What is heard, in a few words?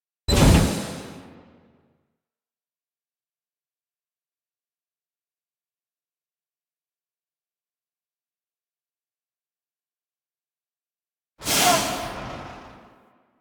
gear stinger; Warframe; NineteenNinetyNine; Stage8ShitboxConvoy